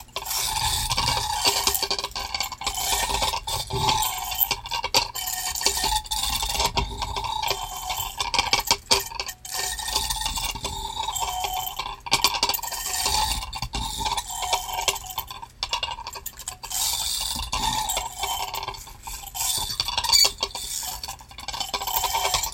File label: Silver Plated Vanity